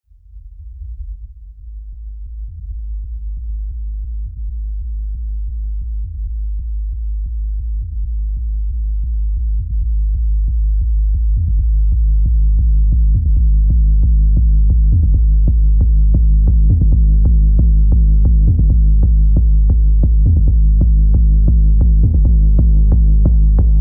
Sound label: Bass; eve full soundtrack 2003